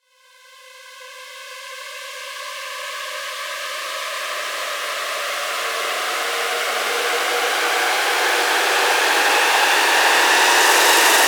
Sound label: Fx Riser, 170